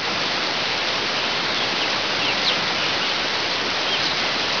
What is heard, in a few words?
Forest Sounds